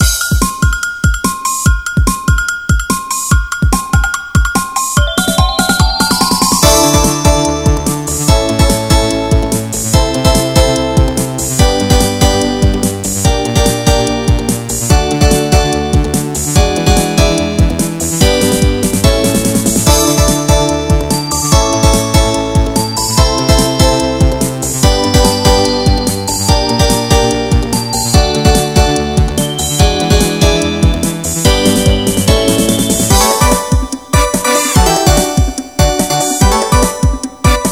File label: Stage BGM; hichi and friends